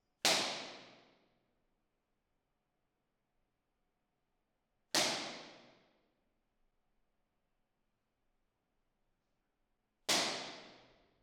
Clapper Omni, Impulse Responses; Clapper Nevaton Omni

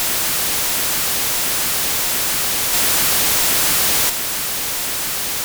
Test Signal

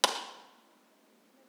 IR clappatore; Teatro Benevento

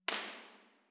Trieste Right Up, Impulse Responses; Ambiovolver

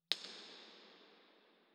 IR sweep BIN; Bergamo; Ricciardi; IMP-RESP

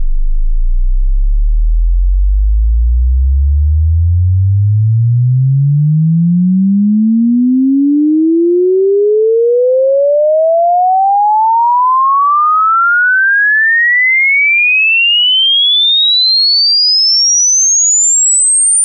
sweep 23.4375Hz 24kHz

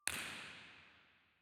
Auditorium, Theatres

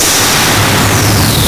Impulse Responses, Rear Left; Ambiovolver

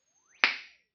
impulse response; Corso acustica

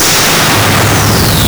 Impulse Responses, Right Down; Ambiovolver

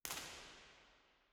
First IR Sala 2800 Dodec Center WY, Soundfield; Santa Cecilia Roma; Measurements 2005

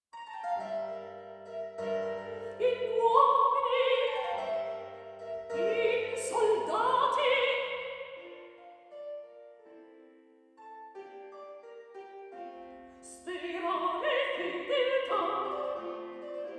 Cardioids R

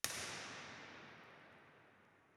IR MLS, Dodecaedron; Bergamo; IMP-RESP

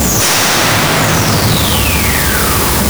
3D Imp Resp; Comunale FE

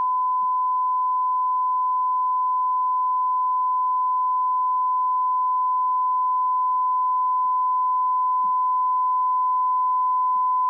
Calib 94dB, Car noise; Zafira

